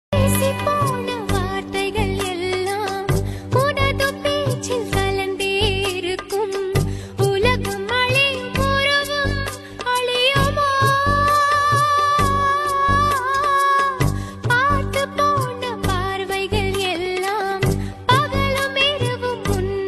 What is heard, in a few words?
Ringtone